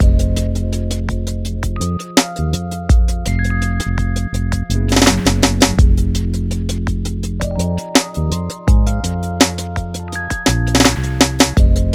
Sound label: 83bpm Ambient Loop